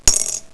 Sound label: coin